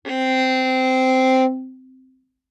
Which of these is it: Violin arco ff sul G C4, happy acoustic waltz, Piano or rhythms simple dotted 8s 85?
Violin arco ff sul G C4